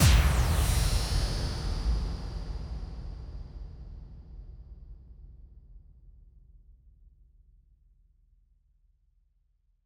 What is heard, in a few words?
bassdrum reverb FX kick; Vengeance Samples